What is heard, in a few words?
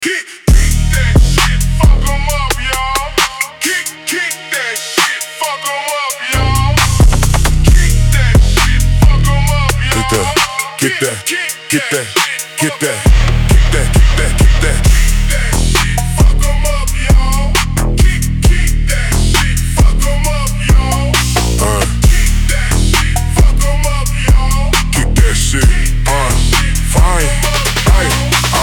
phonk Slowed